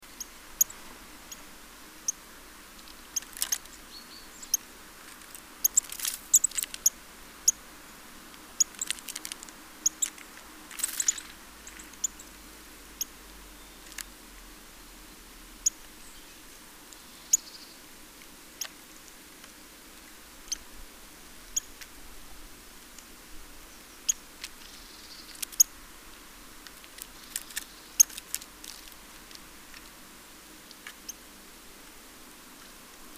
Sitta europaea; SETE

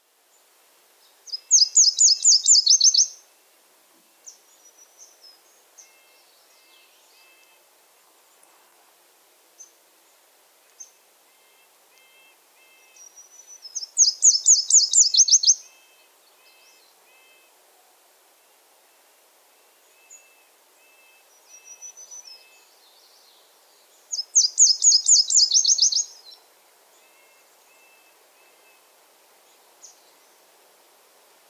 Nashville Warbler - Leiothlypis ruficapilla, SONG

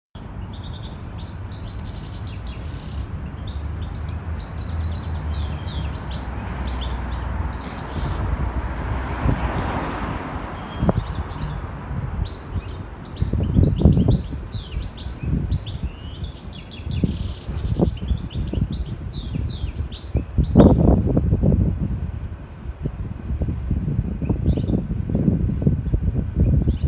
Carduelis carduelis; SETE

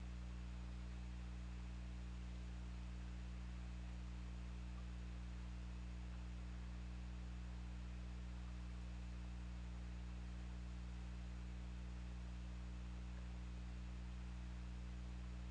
Orca; OrcaLab

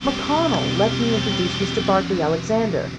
noisy

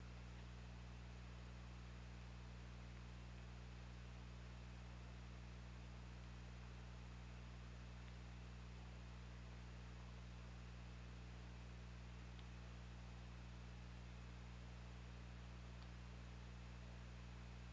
Orca; OrcaLab

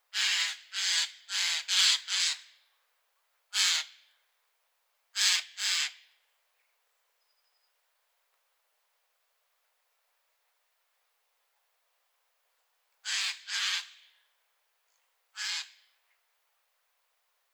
Garrulus glandarius; SETE

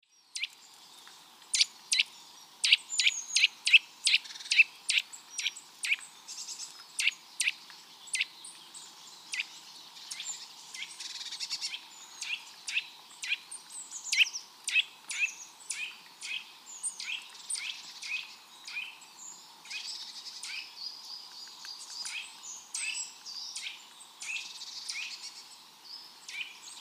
Sitta europaea; SETE

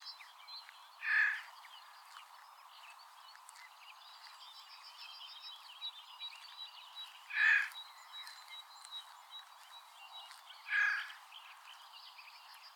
Garrulus glandarius; SETE

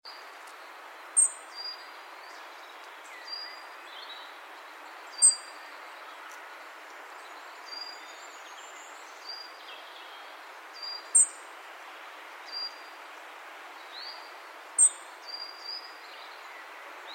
Erithacus rubecula; SETE

